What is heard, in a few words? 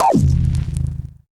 Drums; Distored Reality